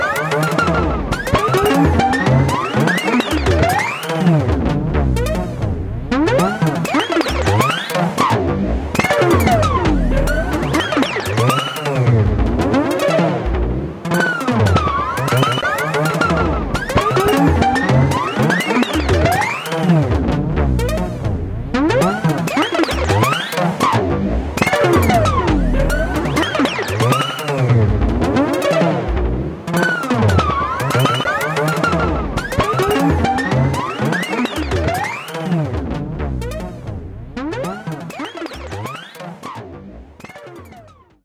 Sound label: Music Loop